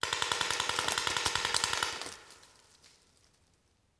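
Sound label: Paintball Gun Impact; Sound Ideas; Guns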